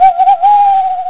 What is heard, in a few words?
OWL, ANIMALS